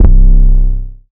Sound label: 808 hit C; Hip-Hop; Tonal; Slate; CAICOS Hip-Hop Sample Pack